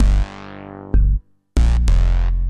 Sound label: HipHop Bass